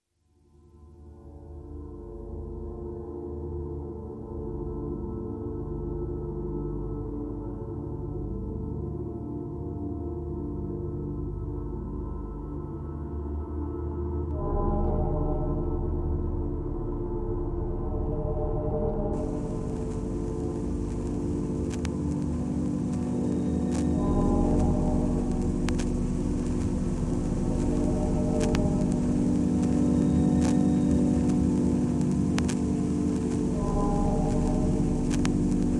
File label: Drones Only